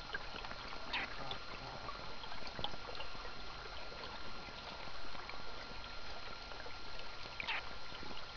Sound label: chirp, river project sounds; chirp groans